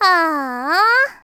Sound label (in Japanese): ああーあ, Voice Breath; Vocaloid 6.5.1; Otomachi; VOCALOID6 AI OtomachiUna Spicy